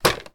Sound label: toy robot put down; sound effects one